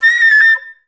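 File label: flutes A5; Best Service; Peter Siedlaczek's Advanced Orchestra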